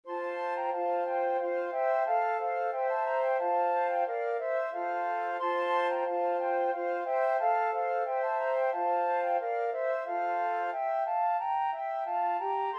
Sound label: flute quartet